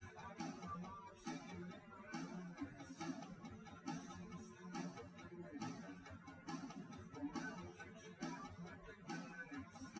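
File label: with drums, live rec; SiSEC08; SiSEC underdetermined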